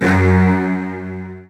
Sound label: Virtuoso Strings, edgy, F#1; ILIO; Kirk Hunter Virtuoso Strings VOL-2; 90 s Sample CDs